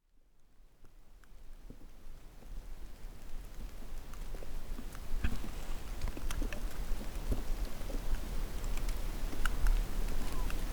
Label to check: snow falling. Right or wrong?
Right